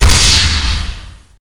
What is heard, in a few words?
fire, weapons